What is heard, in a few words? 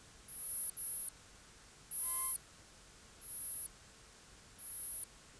Rhinolophus hipposideros